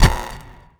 discharge

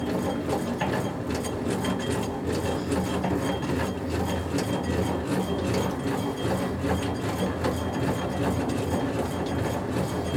turret, tanks; World of Tanks

